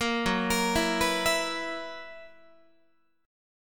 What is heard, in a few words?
F sharp 11th F sharp Guitar; F#11; 13,14,13,14,15,15; arpeggiated